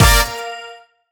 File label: stop bonus; Amatic; Fortune Girl